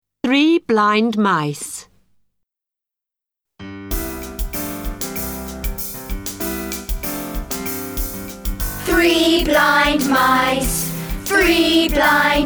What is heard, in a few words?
English 3 Blind Mice